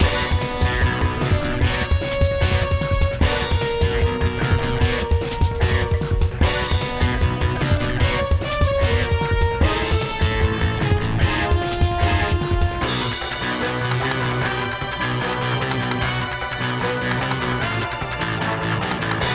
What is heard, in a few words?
Combat Loop